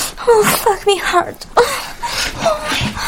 vault hit stinger

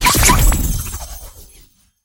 magician animal curse cast; kitty0706 sinclair sounds

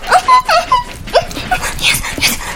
hit stinger; vault

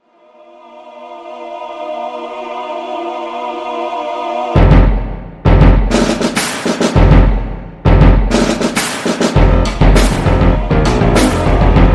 music match intro 160bpm; Deltarune Chapter 4 match intros

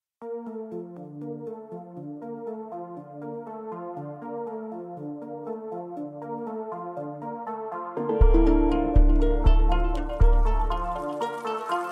music hideout search 155bpm; Risk of Rain music pack; in-game music

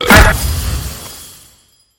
magician animal curse cast impact; kitty0706 sinclair sounds

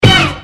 boost; Jet Set Radio movement sfx

